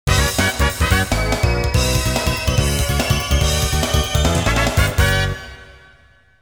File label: Winning Cup; street scooters; ps1